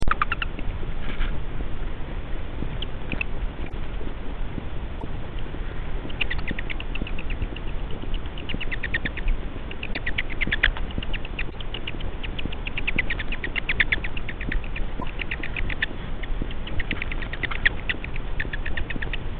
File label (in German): chronischer alter knatterer; raschtul